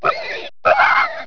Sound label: rattle; Deers Revenge